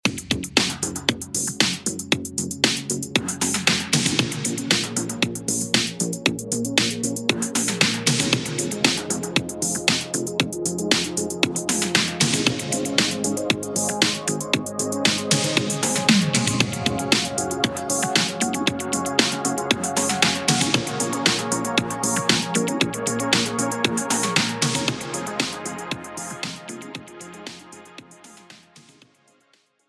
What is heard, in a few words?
Vapors And Waves